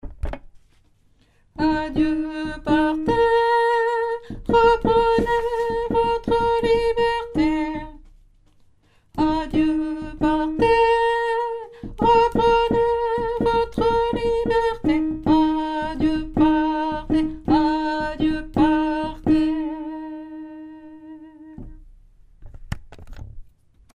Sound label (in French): saltimbanques basse